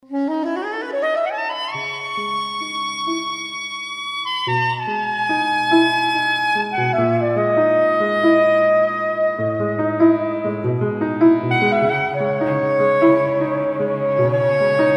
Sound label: Saxophone